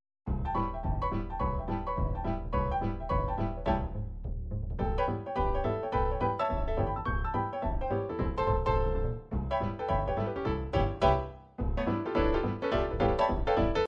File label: ragtime; psr9000